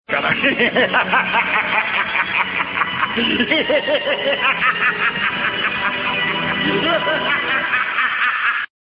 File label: hihihehehehahahaha